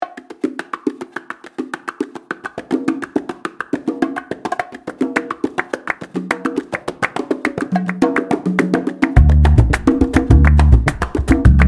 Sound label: flamenco essentials percussion